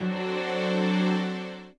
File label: chord